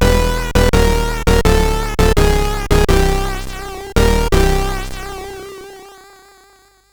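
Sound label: Lights On, MOS CSG8580R5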